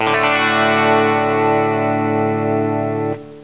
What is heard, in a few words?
electric guitar; Sound Effects for Multimedia - Volume 1